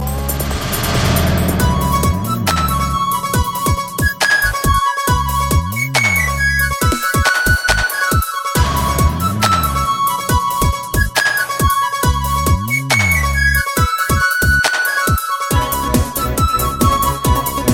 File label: Remix Kannada; Jogi